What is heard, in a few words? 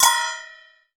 bali cymbal open, cymbals gongs